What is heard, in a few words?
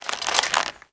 Rope Ladder; moul-assets